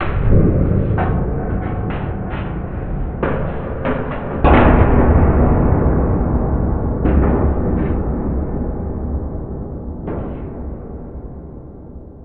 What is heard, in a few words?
big hit far